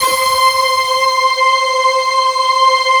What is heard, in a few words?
ICER C6, SYNTH; Q Up Arts; Dream Experience